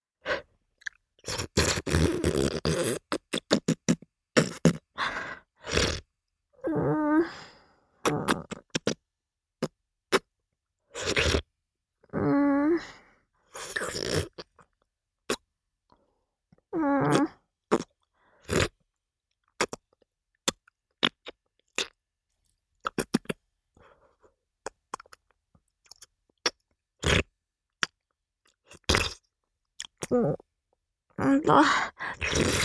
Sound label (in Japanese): フェラチオ (中)：頭を前後にゆっくり往復して陰茎をしゃぶる; 未使用